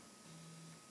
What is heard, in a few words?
step burst, Floppy +3; Mass storage; plus3 disk; zxsp